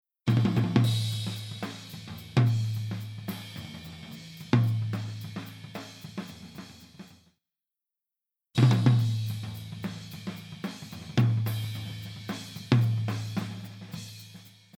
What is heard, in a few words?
Drums Tom H Tom